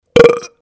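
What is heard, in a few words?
sound of burp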